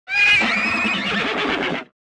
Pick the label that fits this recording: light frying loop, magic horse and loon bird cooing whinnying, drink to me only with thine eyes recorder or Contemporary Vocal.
magic horse and loon bird cooing whinnying